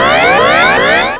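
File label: flag alarm; quakerepo fortress misc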